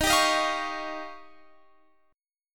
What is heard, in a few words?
D sharp 9th D sharp, Guitar Open F; D#9; strummed